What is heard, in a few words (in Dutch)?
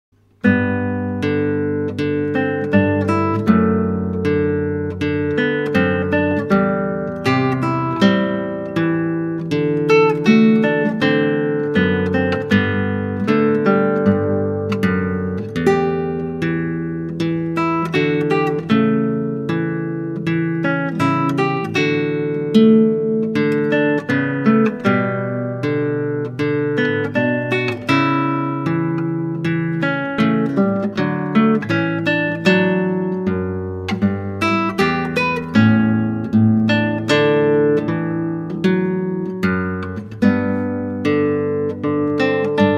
Klassieke Gitaar; Bach 50 Solos